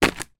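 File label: playing cards handful drop down; zapsplat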